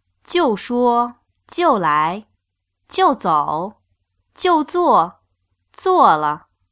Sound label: tones